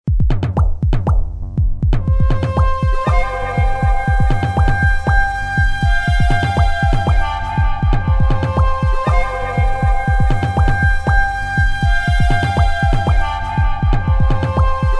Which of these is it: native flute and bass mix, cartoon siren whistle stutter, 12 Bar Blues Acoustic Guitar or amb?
native flute and bass mix